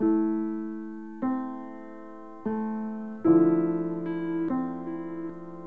keys, sad loops

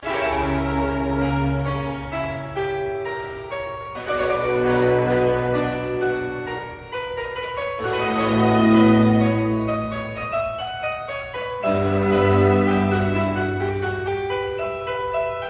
keys victrola, sad loops

